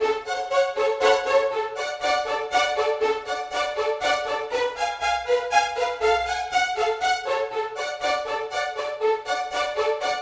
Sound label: violin; playful waltz